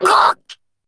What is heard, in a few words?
pain; rin